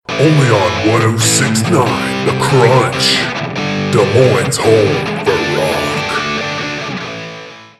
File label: the crunch